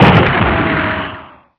Gun

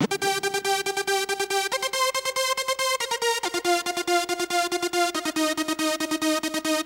Tranc, Melody; Techno Music Maker

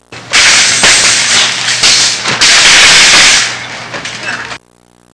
shopping cart crash, cartoon sfx

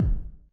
bassdrum; Reaper

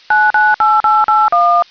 RingOut

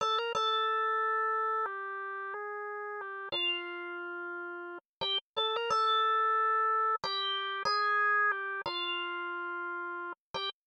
synth; bombogroove 12

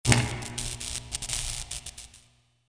unpowered; zones

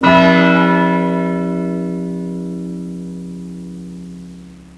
bell; WAV Sound Effects; bells

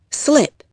SLIP

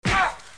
grunt; combat

